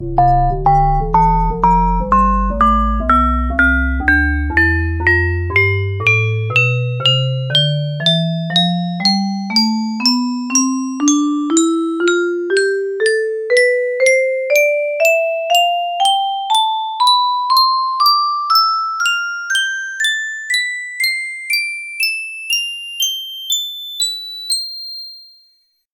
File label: music box tonleiter